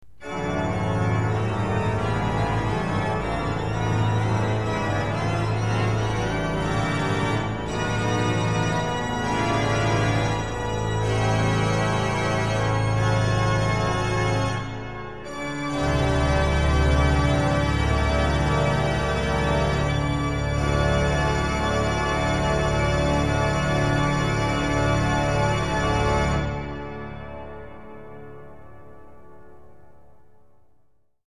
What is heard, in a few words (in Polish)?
tutti